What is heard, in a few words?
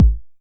909 BD; Goldbaby Tape808 & Tape909; The Tape 909